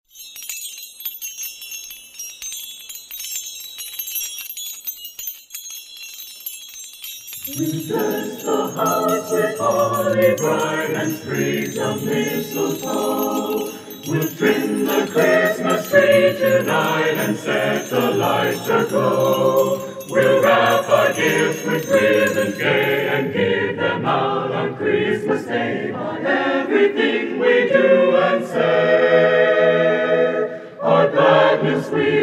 Christmas, Chorale; USC Chanteclairs

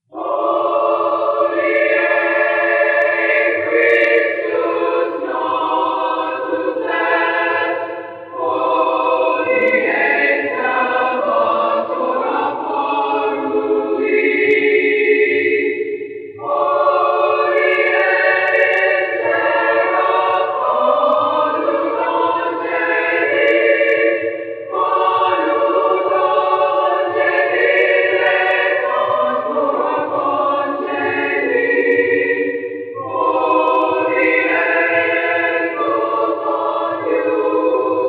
Acappella, Women's Chorale; Nanino, G.M. - Hodie Christus Natus Est; Christmas; USC Women's Chorale -Pgh

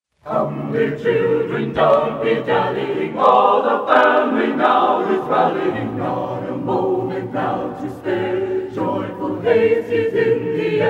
Acappella, Chorale; Come Dear Children; USC Chanteclairs

